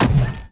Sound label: drop bomb; wob2 demo